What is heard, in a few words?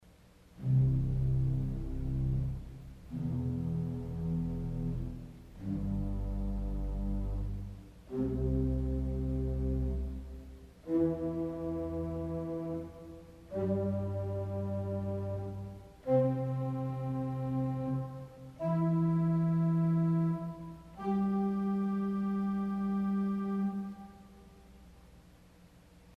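Pizzicato Arpeggio with other stops, organ stop; Culver Academy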